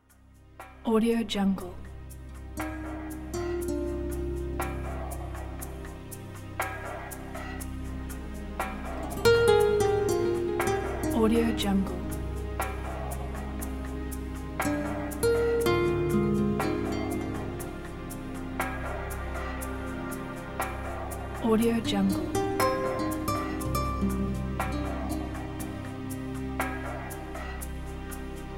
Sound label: slow classical guitar groove